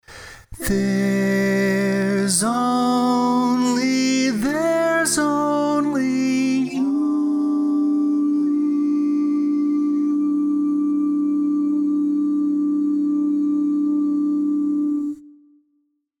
Lead, barbershop

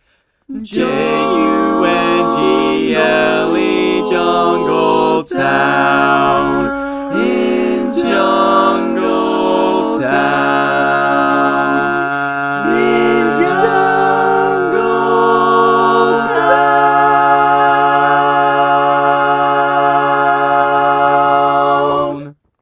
All Parts, barbershop

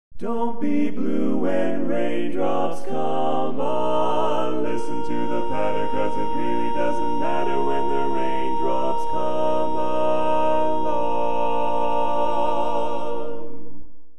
When Raindrops Come Along, Tenor, Barbershop

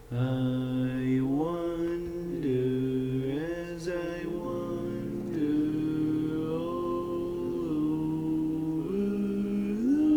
Other, barbershop tags

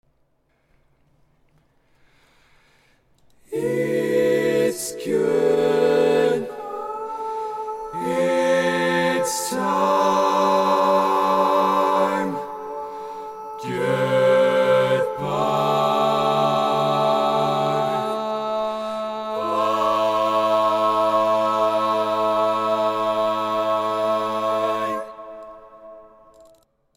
All Parts, barbershop tags

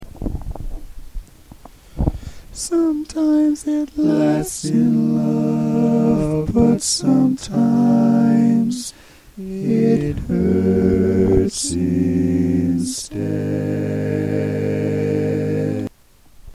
barbershop tags, all parts

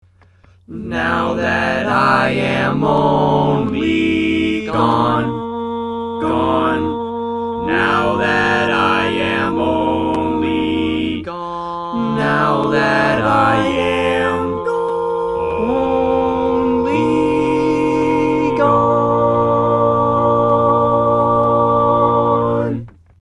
All Parts, barbershop tags

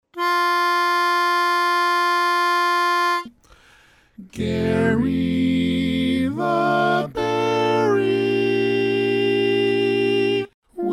tenor

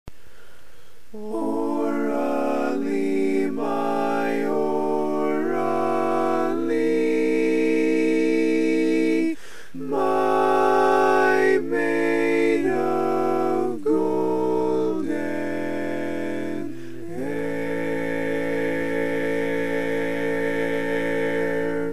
Tenor, barbershop

